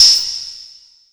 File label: anvil, Percussion Ticks; Misc Percussion